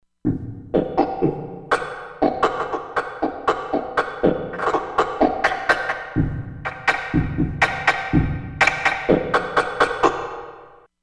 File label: Frog Hit, Korg MSC-16S; Korg MSC-16S Environment